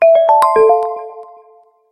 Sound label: windows phone 8